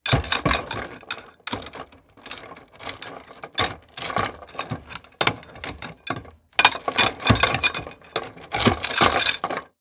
Industry Lock Metal Lock With Chains Picked With Tool